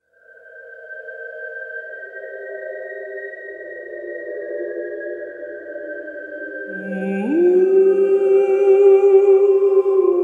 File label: Tenor; ILIO; Vocal Planet VOL-6; Symphony of Voices